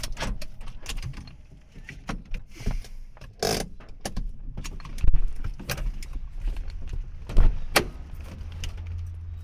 Die Bremse; Fieldrecordings; Brasilien

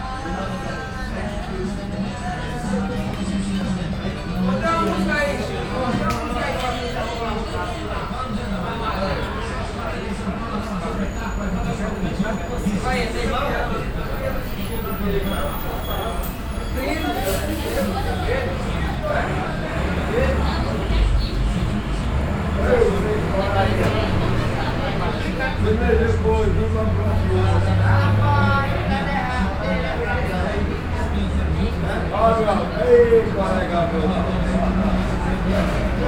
auf der strasse, Brasilien; Fieldrecordings

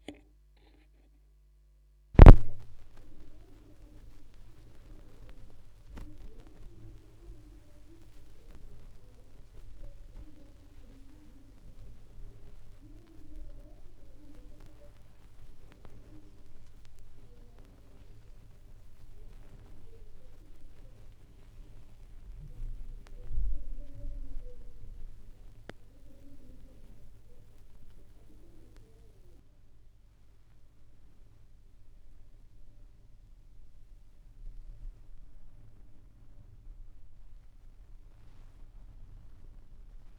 schallplattengeraeusche, Vinyl

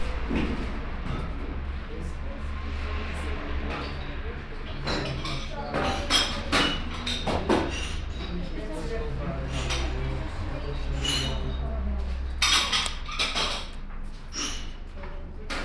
Cafe in Lisabon; Fieldrecordings; Cafe in Lissabon

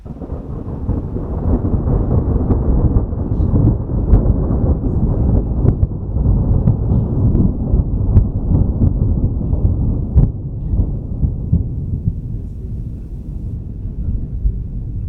Gewitter; Fieldrecordings; ca 3 Uhr morgens

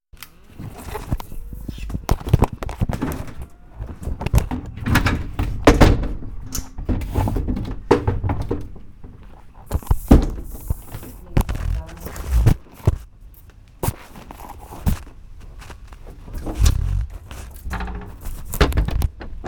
Samples, Gewitter; Fieldrecordings; ca 3 Uhr morgens

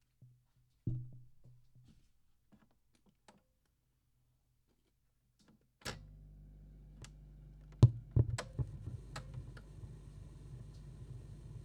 Echo Mode 2 (Lautsprecher Tonband), Trommeln im Regen Tape Echo Mix

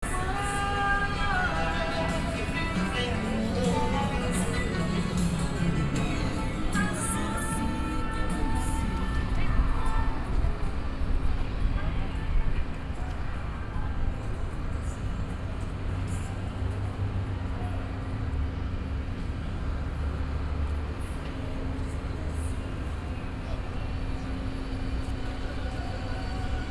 aufm markt, Brasilien; Fieldrecordings

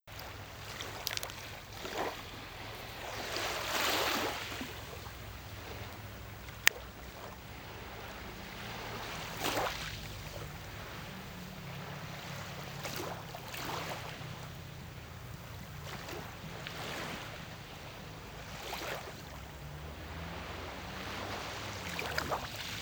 am Hausstrand; Greece; Original Video Ton; Fieldrecordings

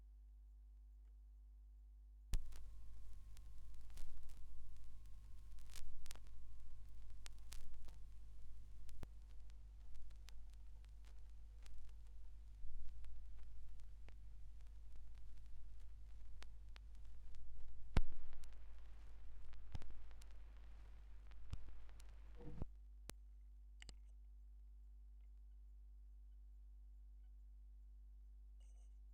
schallplattengeraeusche; rauschpartikel

